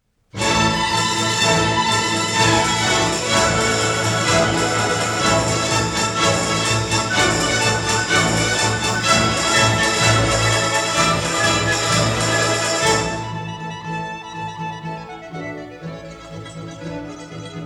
Capriccio Espagnol Op34; Rimsky-Korsakov

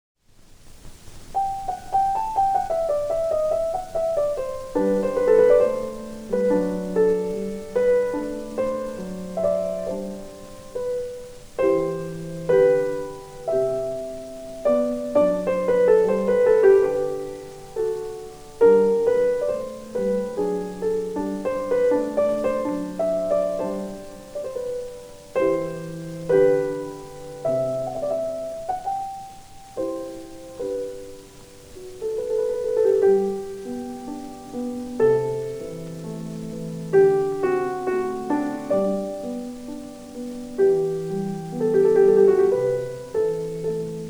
Piano Sonata; Mozart; Piano Sonata No9 K311; Landowska

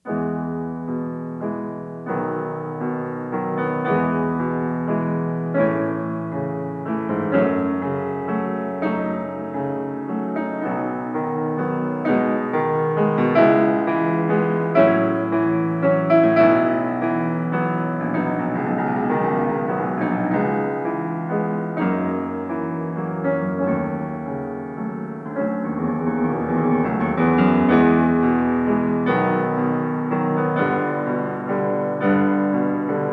Preludes; Chopin

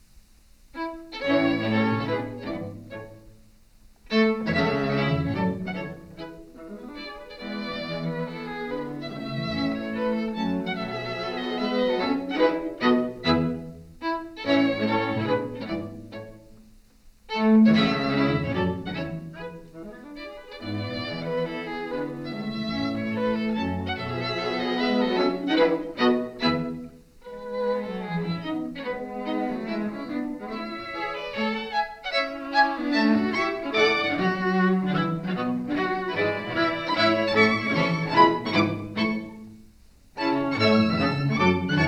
String Quartet; Beethoven; String Quartet No15 Op132

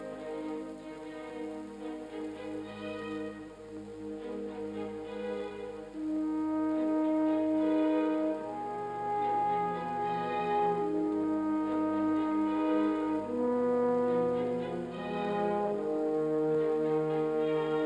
R Strauss Horn Concerto No1

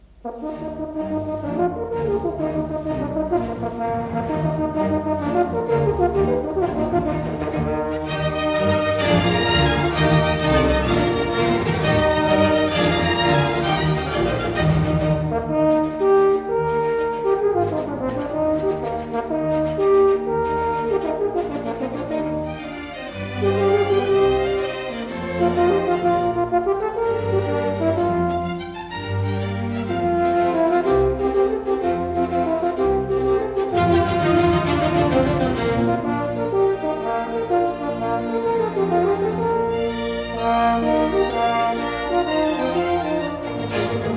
Mozart Horn Concerto No4

